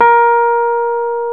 PNO, Rhodes Mk.V; Keyboards of The 60's and 70's

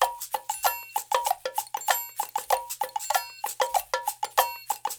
96, Percussionworks loops; Sampleheads; GJ LOOPS 96

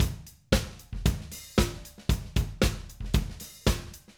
Acoustic Live Drum

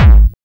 hard bass drumz

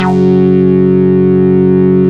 SYN, analog; Sound & Vision - Gigapack I CD 2 (Roland)

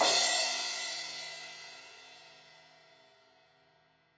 Crash 16in edge, Cymbals; Deeply Sampled Drums

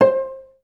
CLS PIZ C4, Advanced Orchestra; Best Service; Peter Siedlaczek's Advanced Orchestra

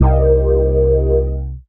Dance Mega Synth; Best Service; 90 s Sample CDs

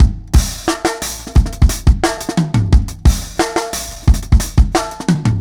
Half Groove, Breakbeats; KJ Sawka; Drum n Bass & Beyond

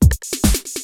LOOP, ENSONIQ TS-10; Rubber Chicken Software Sample Bank

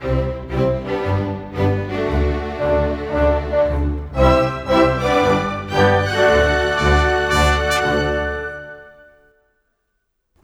Rock Pop, Orchestral; Sonic Foundry (Sony Creative Software); Orchestral Series VOL-4; Rock and Pop; Rock-Pop 03

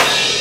crash, snes chip

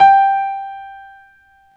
PNO Grand Pno; Club-50 - Foundations Roland

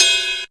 EASY RIDE, HATS + CYMBS; Zero-G; Funky Elements

